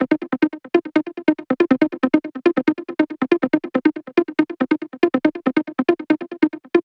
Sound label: 140BPM C; Vengeance - Essential Clubsounds Vol.4